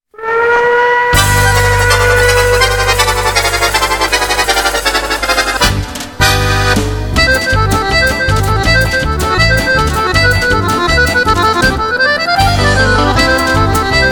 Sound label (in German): virituoses akkordeon